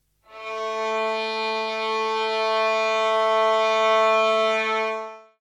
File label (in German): Ngeige, makam musik